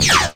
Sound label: 1 Shot Alien C5; Digital Sound Factory Xtreme Lead